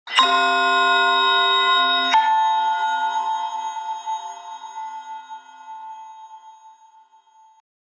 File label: Folding bell high, Ringtones; Samsung; GT-I8320 Vodafone 360 H1